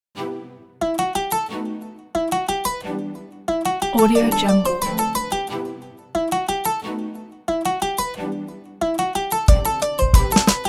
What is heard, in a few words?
east coast hip hop